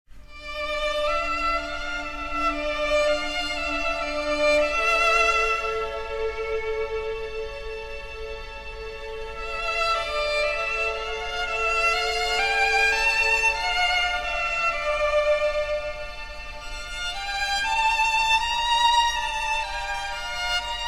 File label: strings